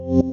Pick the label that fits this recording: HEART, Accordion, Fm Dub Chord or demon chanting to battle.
Fm Dub Chord